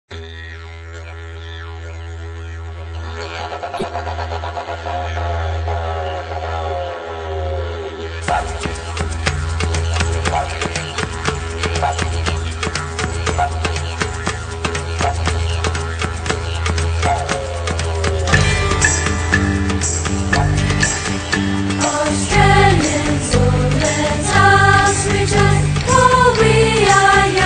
singing

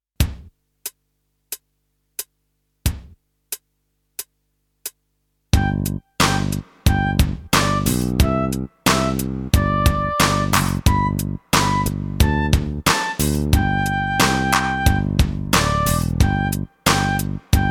recorder practice; old macdonald

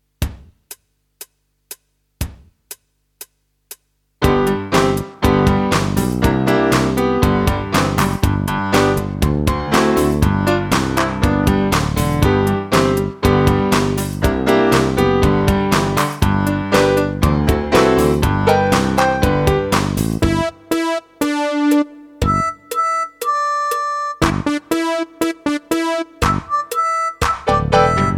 recorder performance; old macdonald

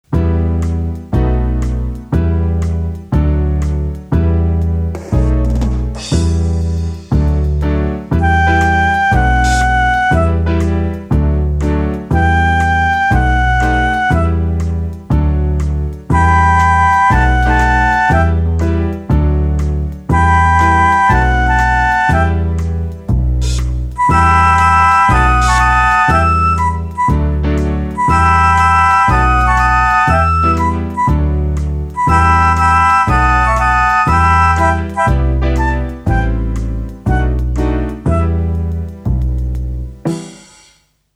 recorder swing sensation 3 minor mice performance

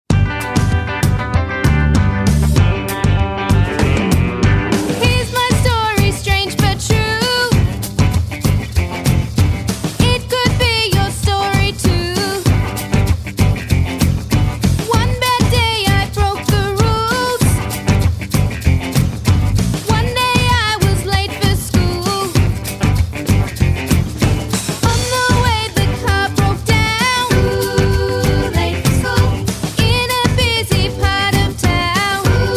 late for school blues

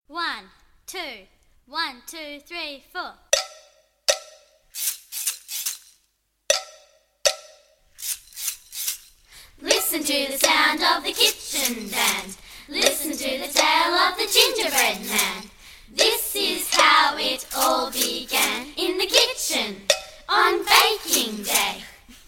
kitchen rap; gingerbread man; musicals

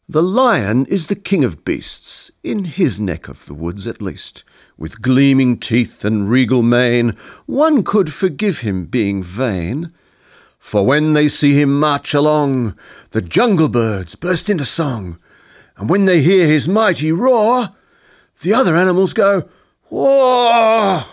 primary music recorder carnival poem

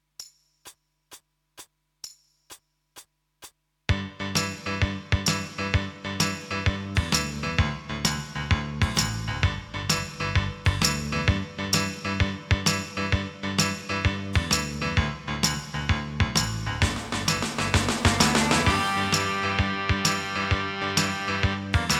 recorder heard it before performance

